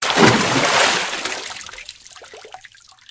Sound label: waterfall; Golden Crayon Sounds